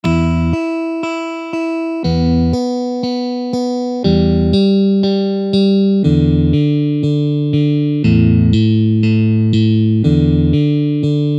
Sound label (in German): Zupfübung; pfadfinden